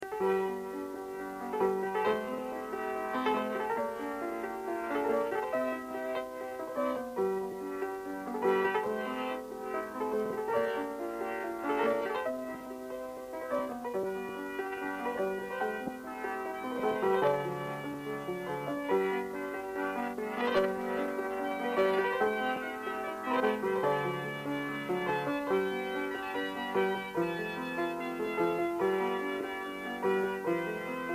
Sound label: preludia synth